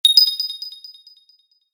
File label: Digital Chime Sparkly Ting, also telephone SMS message receive; Digital Clicks, Blips & Alerts